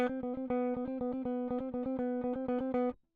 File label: electric